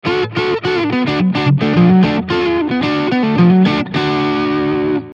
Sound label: Country Crunch